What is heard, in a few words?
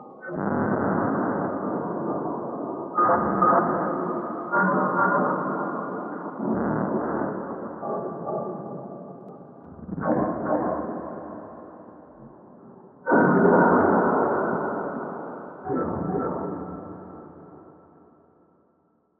Emerald Cave, Ambient; SFX; Ambient - General